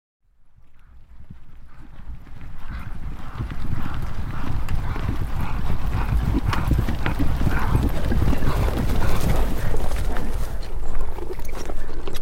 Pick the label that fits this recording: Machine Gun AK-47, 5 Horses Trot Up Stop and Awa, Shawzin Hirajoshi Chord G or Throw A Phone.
5 Horses Trot Up Stop and Awa